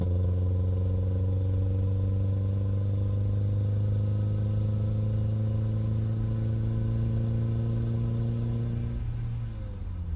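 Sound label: engine low loop; Blind Drive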